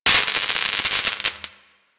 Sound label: UI Crafting Spark C; Warframe